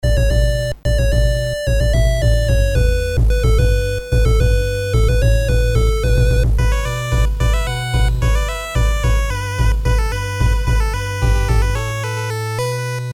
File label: skyrim 8bit short